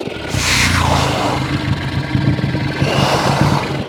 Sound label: swarm large warship idle; Stellaris; other entities